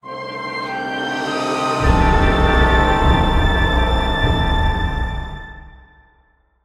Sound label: Mystery Ensemble Large Wet, RPG Orchestral; InspectorJ; RPG Orchestral Essentials (Music FX)